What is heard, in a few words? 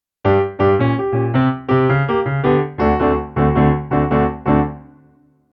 Blues Introduction Key of G